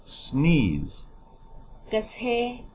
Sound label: sneeze